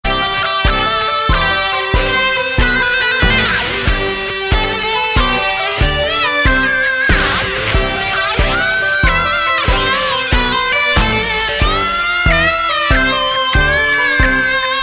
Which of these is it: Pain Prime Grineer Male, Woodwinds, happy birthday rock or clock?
happy birthday rock